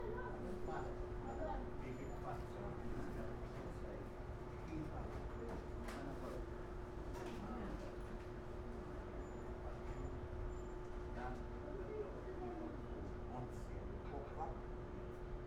AMBIENCE Cafe, 8 People, Calm, Winter, Machine Buzz, Toronto, Canada; Quad; Articulated Sounds; American Public Spaces